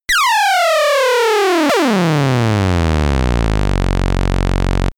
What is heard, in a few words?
synthetic signal